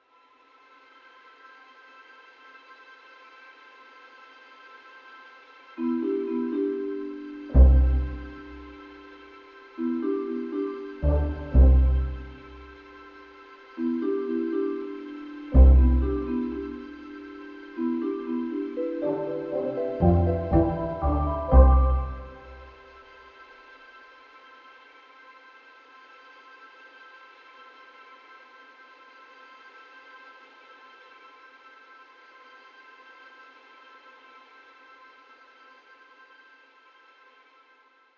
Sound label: Loop Minimal, 120bpm; jenny leclue detectiv